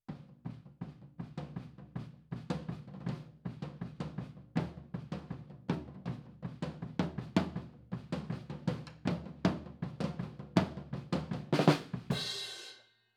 HiHat, 160 BPM

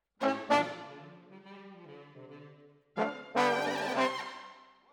Trombones, jazz for piano

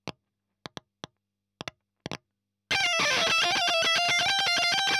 EL Fuzz End

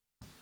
SNR

